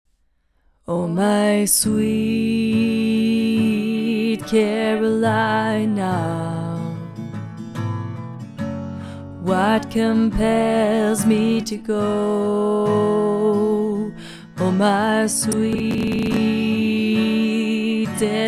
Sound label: low voice